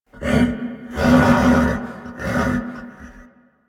Horse Vocal Mount Neigh; Vocals; Warframe; Vehicles; Duviri

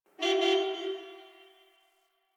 Distant Car Horn

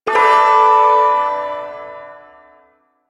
Shawzin Maj Chord; Warframe; Duviri Ersatz Shawzin

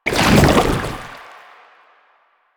Chem Strike Goo Layer, Footsteps; Enemies; Soldiers; Foley; Warframe; Lasrian; Nox

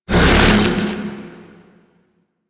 Fusion Pillar Cast; Warframe; Warframes; Qorvex

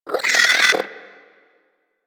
Hyena Micro Vocal Angry; Warframe

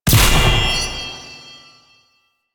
Charge Ability Cast; Enemies; Abilities; Warframe; Duviri; Duviri Dax; Dax Knight

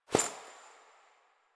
Body Move

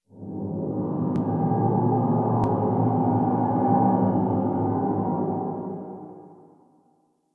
Exterior Distant Calls Angry, Ambience

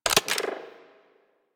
Arch Gun Alt Mech, Weapons; Warframe; Archwing; Hildryn

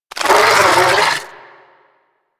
Amalgam Moa Satyr Vocal Attack; Warframe

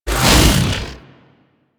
Ice Wave Leg Whoosh; Enemies; Abilities; Warframe; Corpus; Arachnoid Camper Terra

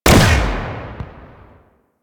Prime Shot Secondary C, Bard Rifle; Weapons; Warframe; Tenno

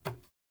Ladder Climb Wood; Player

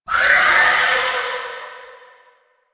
Blade And Whip Heavy Vocal; Weapons; Melee; Warframe; Dagath

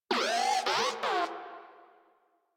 Moa Compassionate Vocal Enemy Spotted, Pets; Warframe

